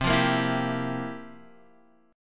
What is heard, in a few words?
F Major 7th Suspended 2nd Suspended 4th, C, guitar